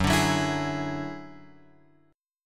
F sharp 7th Suspended 2nd Sharp 5th F sharp; F#7sus2#5 Chord {2 5 4 X 5 4} strummed

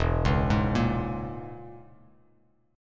E 6th Flat 5th Bass Standard, E6b5 chord; arpeggiated; JGuitar

